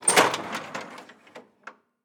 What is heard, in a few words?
copier compartment open